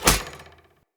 Hunter Bow Arrow Impact Armour; Weapon Impacts; Bows; Envoy; Combat

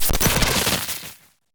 Queens Lair SFX Interference Part C End Hit; Warframe; The War Within; Cinematics

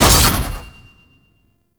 Burst, Tonfa; Boltace; Warframe; Tenno

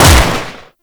Grineer Lever Action Rifle Shot; Weapons

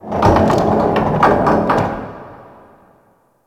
Ice Metal Rattle; Warframe; Corpus Ice Planet; Ambience

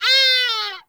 Forest Animal Cries; Warframe; Grineer Forest

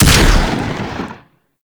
Double Barrel Shotgun Fire; Warframe

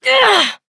Struggle Short, Adult; Warframe; Duviri; Drifter; Efforts

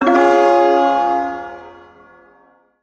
Ersatz Shawzin Phrygian Chord B; Music; Chords; Warframe; Duviri

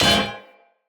Melee Hammer Impact Generic; Weapons; Impacts; Hammers

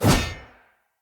Player Sphere Polearm Whoosh; Weapons

